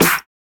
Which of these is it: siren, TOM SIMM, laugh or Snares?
Snares